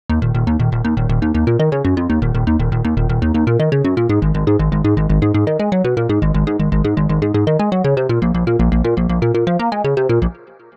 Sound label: plucked sound; the analog sound waves